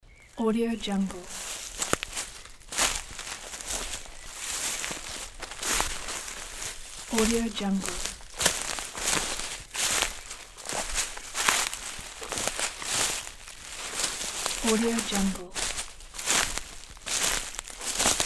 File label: leaves footsteps